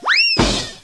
flitz; Loewe1

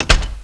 Sound Waschm2

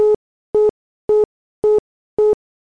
BESETZT kurz; TKKG 2

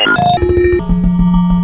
telefon

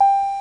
ampel; speedy

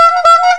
hupe; speedy

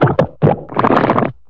schlamm

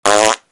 gitarr; Pippi

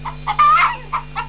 Glucke

